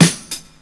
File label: old school hip hop steel snare drum e key; hip hop snare drums